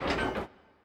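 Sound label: space power obelisk piston; smite; Anhur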